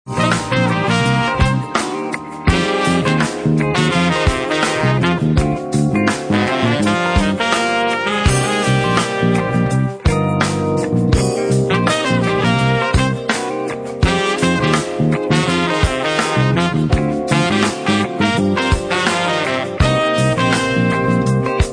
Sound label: oh the horns